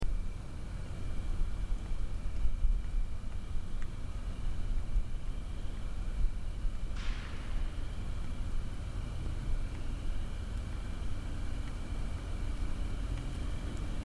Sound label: knock